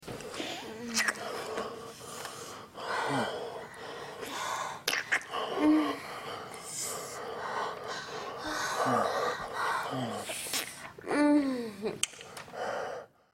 lick

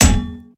shield bash; A succubus is born; combat

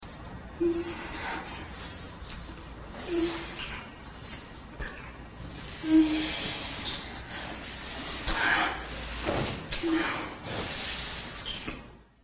kiss

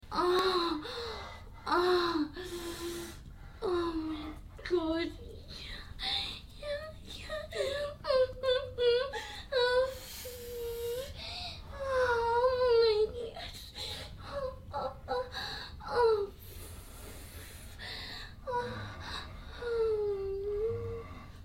moan

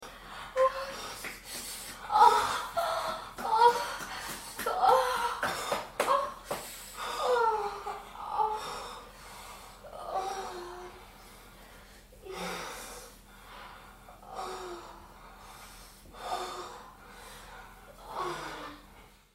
moan

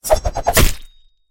knife throw hit, rogue; A succubus is born; combat

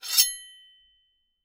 rogue draw; A succubus is born

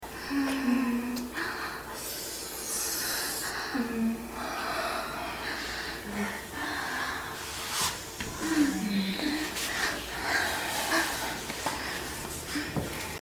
massage

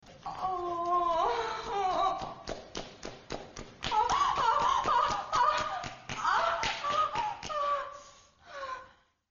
moan

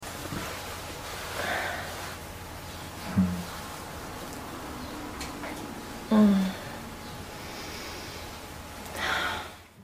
sigh

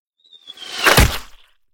precise shot, combat ranger; A succubus is born

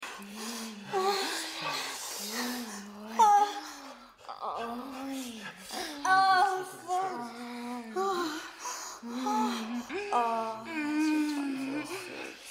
moan

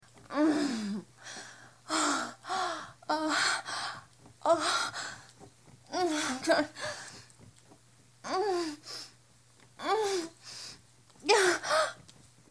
moan

